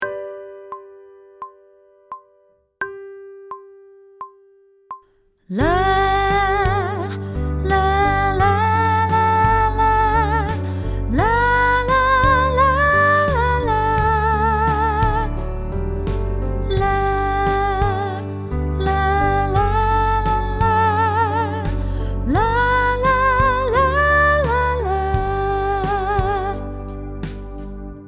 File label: Sight Singing with vocal, pop vocals; Grade 2